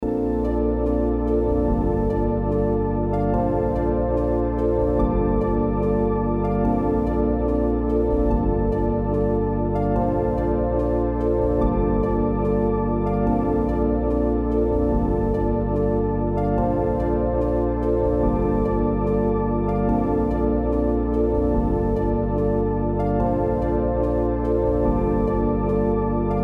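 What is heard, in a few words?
A minor 145 BPM Pad Synth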